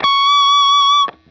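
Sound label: fuzz guitar vibrato; MAYFUZZ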